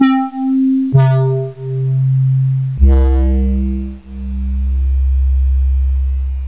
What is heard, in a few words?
Mellow Synth; musicx22